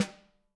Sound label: WARM GLDSTON, Living Drums; Sampleheads; Peter Erskine